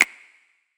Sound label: Tape Snap; Lofi